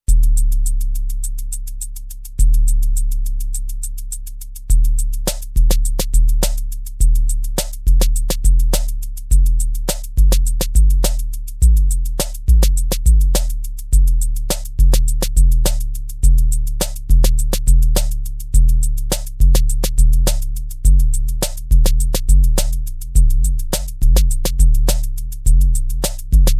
Kick Boom; KickLancet

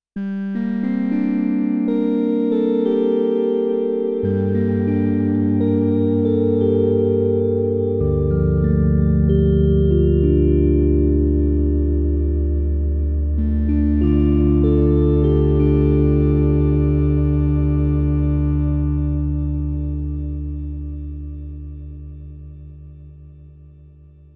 Melancholic Pad; Single Super Gemini

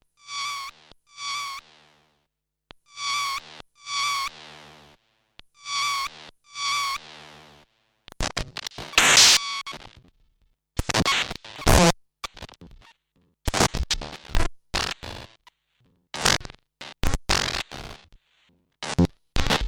LFO to Sample Start Looped Sample

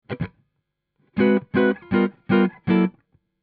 Guitar Chords Dry; U-He Twangstroem

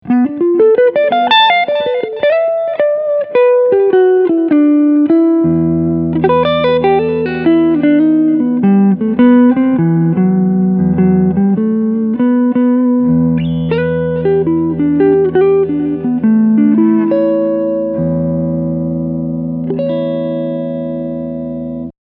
Front HB Clean Picking

